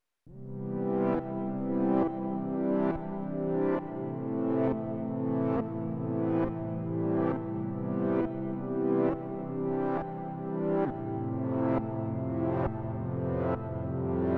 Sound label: ac pad